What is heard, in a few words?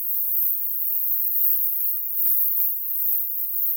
Flange, Train; OpenBVE; Carmillon